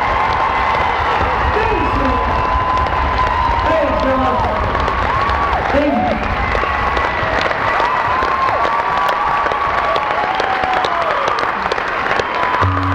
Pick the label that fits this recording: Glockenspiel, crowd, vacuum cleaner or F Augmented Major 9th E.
crowd